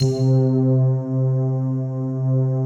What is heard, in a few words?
PAD; Best Service; XX Large; Pads